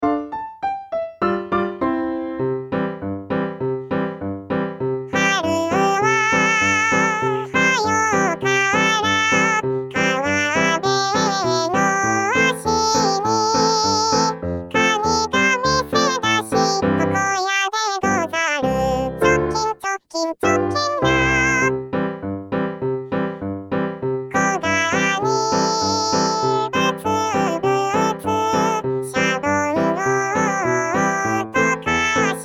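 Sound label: female soprano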